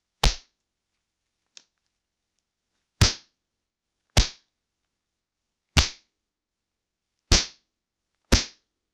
slapping and grabing old, cloth

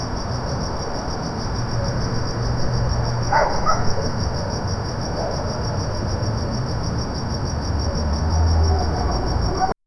silent city barely any, low wind

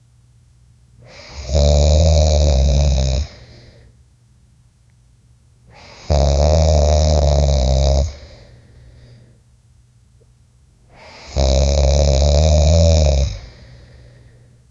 10 or more men snoring; sleep

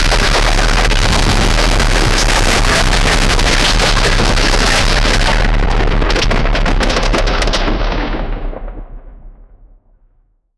a horde of massive trolls, approach

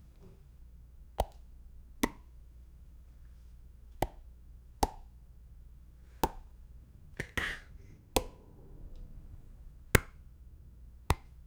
punching someone in the f; boxing

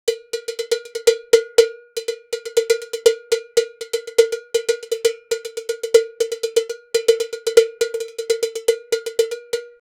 cowbell drum

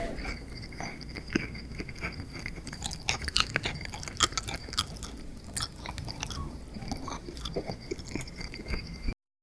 eating a cake, dessert

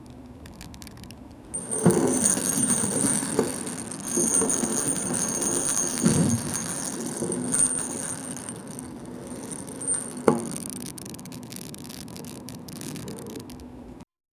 the sound of leather like, dining

